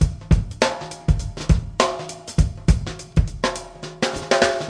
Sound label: ringing break, 102 bpm, drum loop